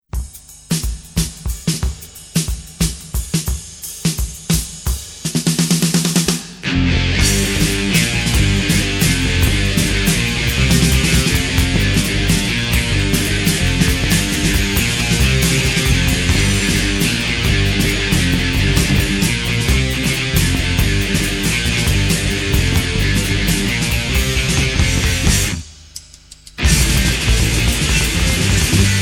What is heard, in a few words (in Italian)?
rabbia jazz